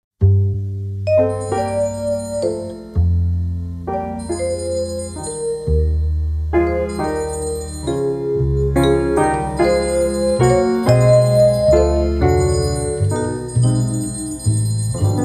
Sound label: Jazz Quartet